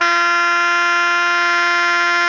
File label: SOP GR F 3, Saxophones; Greytsounds; Philip Wolfe David Crozier's Saxophones